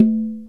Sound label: PERC KALIMBA